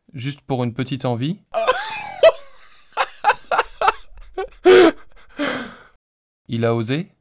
rire synthese basique

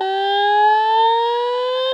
Glissando Avec Attenuation

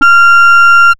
Canard; Emergence of self sustained oscillations in single reed woodwind instrument

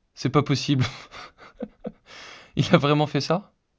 rire naturel

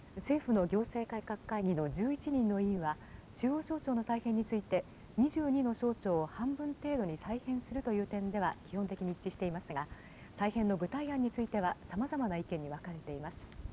melodie

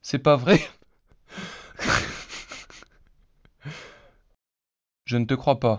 rire synthese contextuelle

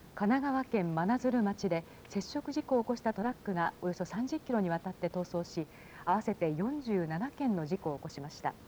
melodie

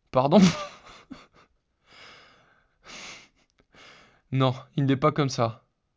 rire naturel

